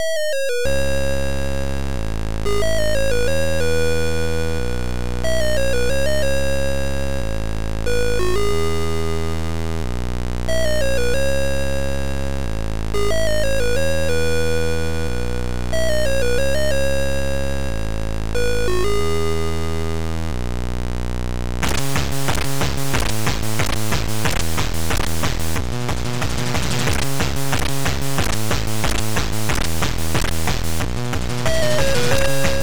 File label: Saxsolo Mix; Emperio